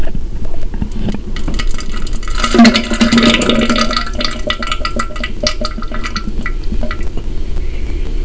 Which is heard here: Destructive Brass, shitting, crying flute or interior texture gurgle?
shitting